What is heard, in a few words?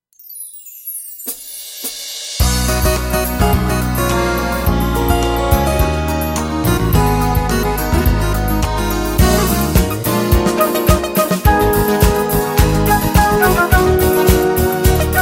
vocal; Somos uno en Cristo